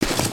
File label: Pet Warthog Dig; creatures pets